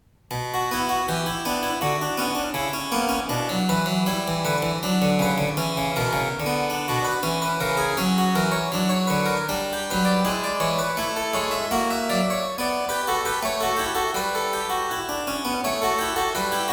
Prelude and Fugue in C minor, Well Tempered Clavier; BWV 871; Johann Sebastian Bach